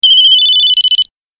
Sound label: Sony Ericsson Old, zil sesi